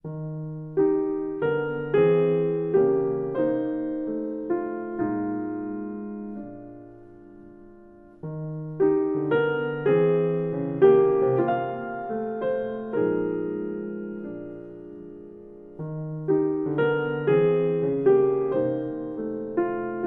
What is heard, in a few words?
Solo Piano